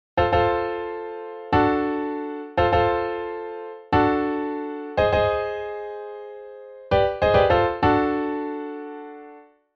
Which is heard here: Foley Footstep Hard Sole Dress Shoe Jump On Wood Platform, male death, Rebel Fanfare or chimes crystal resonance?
Rebel Fanfare